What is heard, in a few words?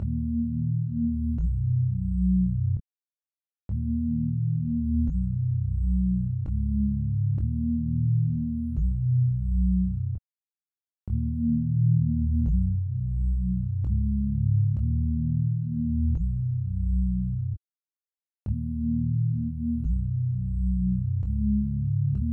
130 BPM D# Min Bass; Melody; Loop Stems; Cymatics; 2022 Melody Collection - Wavy; Run Dat